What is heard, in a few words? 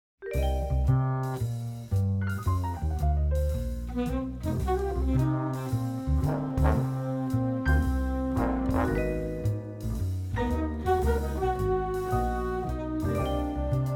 Music